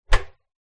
household washing machine door open internal inside